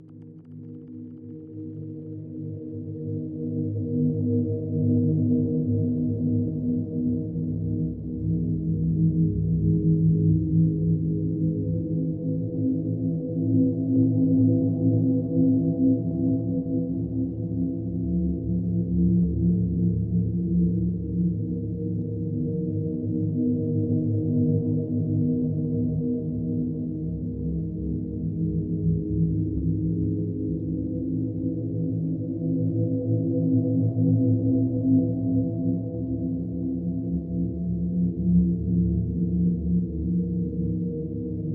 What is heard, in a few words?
amb music